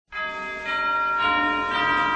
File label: chimes